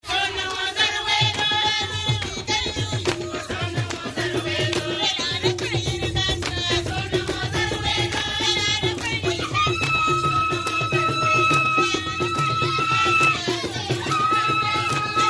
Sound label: gungonga, ghana kassena